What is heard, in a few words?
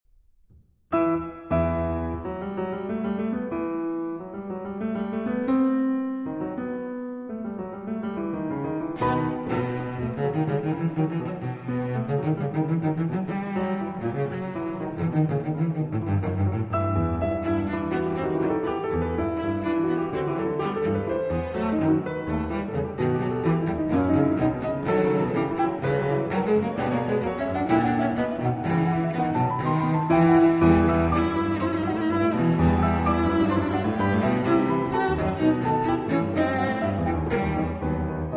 Cello Sonata in E Minor, Allegro; Cello Sonata No. 1; op. 38